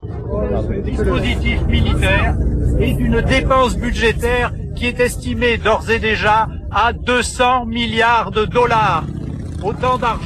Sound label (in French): Avant guerre manif stop Bush